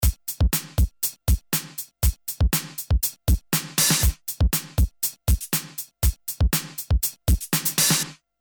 Audified Synergy R1 Drum Loop saturator opamp

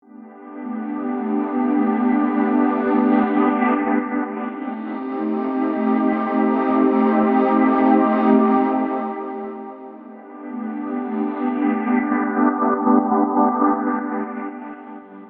Pad; Supersphere

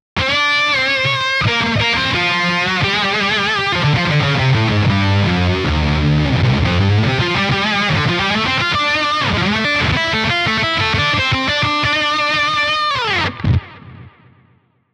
Glitches Riffs; Neural DSP Archetype Rabea; Overlord

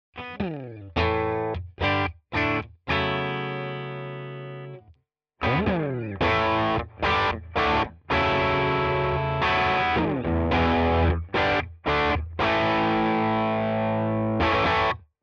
DRIVE Smooth; Walrus Fundamental

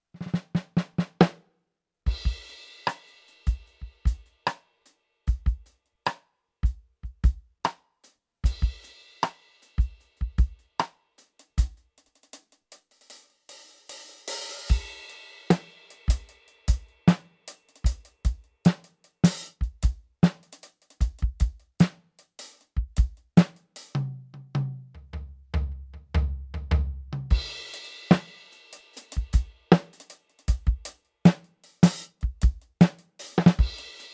EFNOTE 7 Kit Gentle Notes

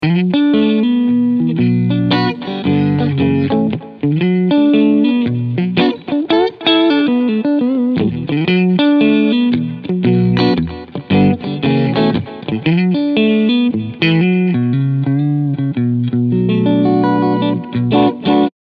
Clean Position

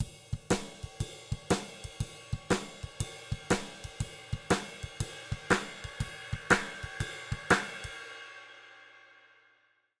Drums MF 700 0 bis 15 MF HiQ; RND Portico 551

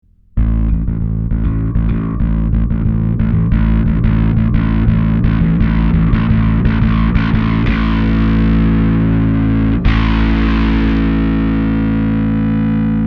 Darkglass Microtubes X7 High Drive

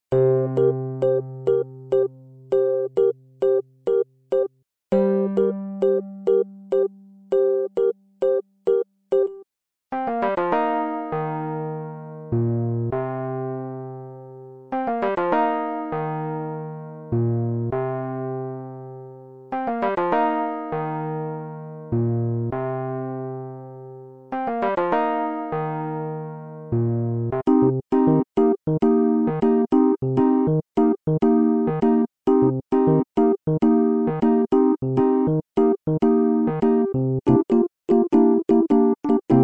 keys

